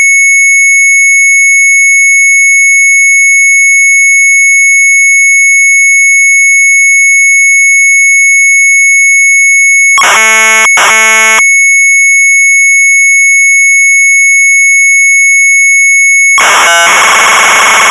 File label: Sharp MZ800 Tape; ALPHA - SORT.; retromelsarchive